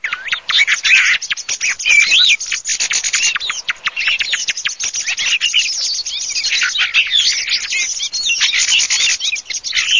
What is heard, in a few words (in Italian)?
TORDO